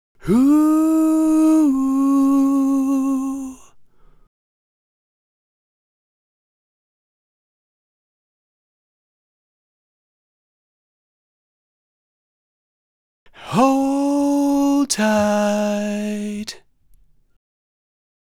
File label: vocal a little house noise in some sections